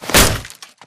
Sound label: crawl grunt; Titanfall 2